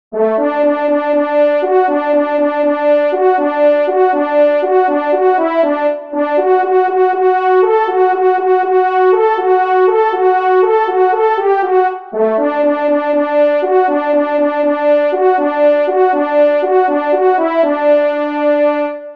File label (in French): Retraite manquee Solo